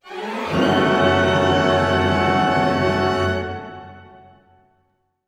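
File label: orchestra; Masterbits - Switch FX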